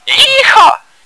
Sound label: whoo; cstrike; bot